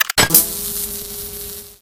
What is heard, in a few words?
bolt load, crossbow; weapons; Garry's Mod; hl2